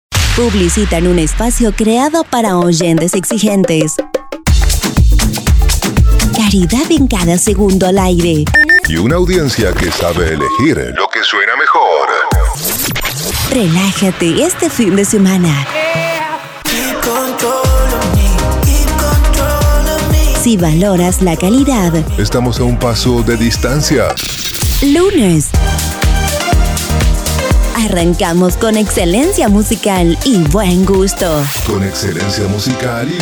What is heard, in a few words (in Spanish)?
Arte Ambient MIX Invierno; invierno 2025